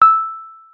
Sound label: WURLITZER, E6; Xsample VOL-1; 90 s Sample CDs; WURLITZER RS